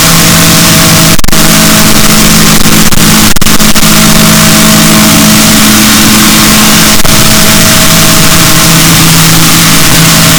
rorquals negatif, boat

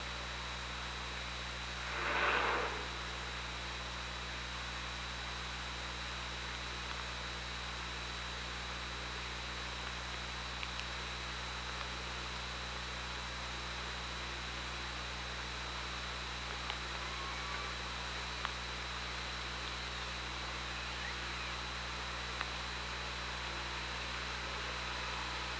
OrcaLab

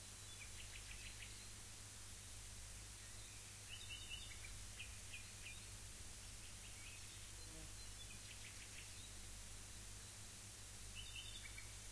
rayol farm; SEAMED

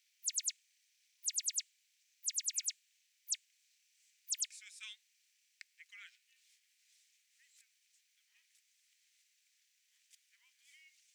DRONES

